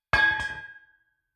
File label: Mechanical Large Metal Pipe Dropped On Ground Impact Two Parts